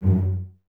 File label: CES F#2 Pizz, Cellos, String Ensembles; Miroslav Vitous